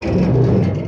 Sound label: damage vehicle; World Of Warships